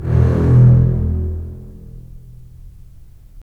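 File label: STR, strings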